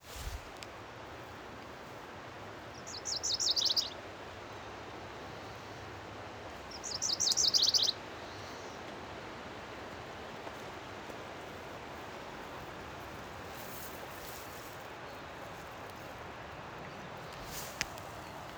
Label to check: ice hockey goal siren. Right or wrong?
Wrong